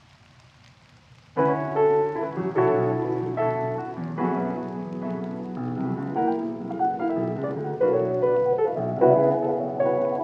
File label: Lofi